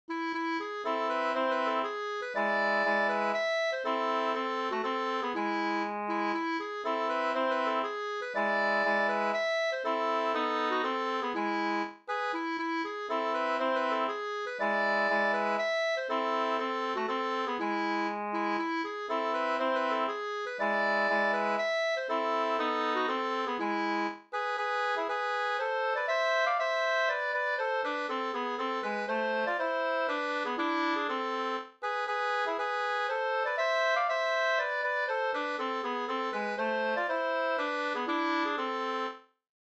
polska vispolska

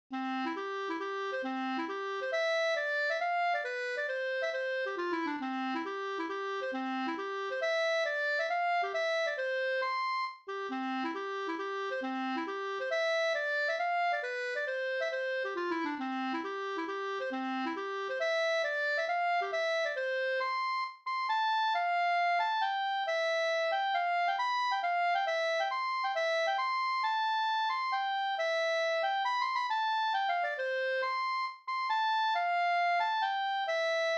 hambopolska; Hambo fran Kryssbo

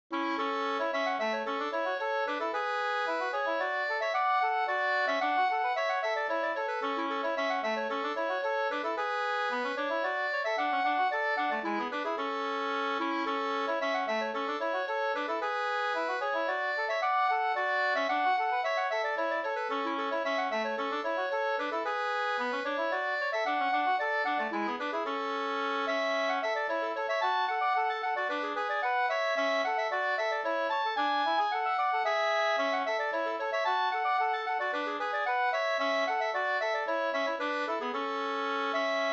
polska Bingsjopolska Tiokronorspolskan; e Hjort Anders e Ola Backstrom 2st

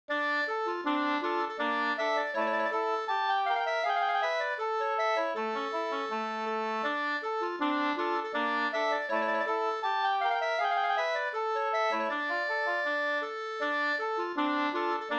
ganglat; Leksand

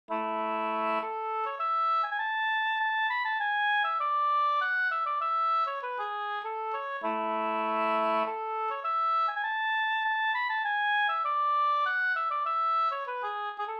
polska Bodapolska

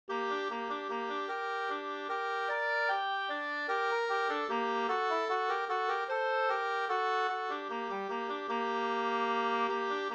vals; Osteraker

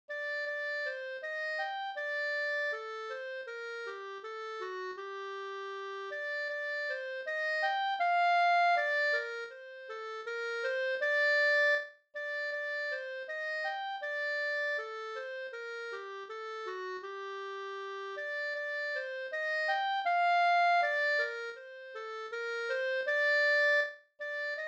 vismelodi Andante; i viston av W Gelotte

